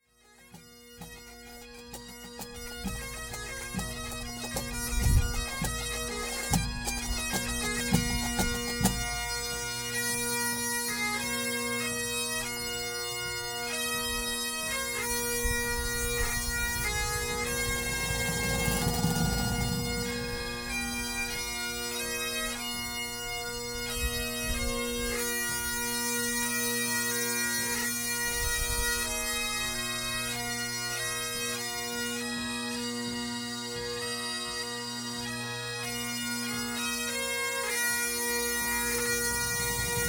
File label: pipe band; Wondrous Love